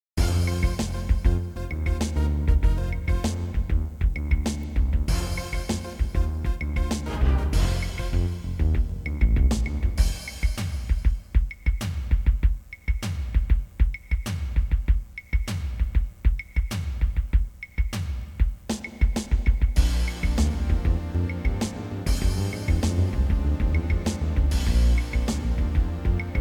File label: Clock Room; Castle Of Dr. Brain; Ken Allen, Chris Brayman, and Rob Atesalp